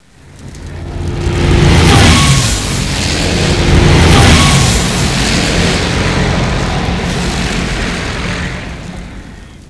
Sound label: flyby double; dod